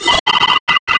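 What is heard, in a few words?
Robot Orbot Taunt; D3DEMO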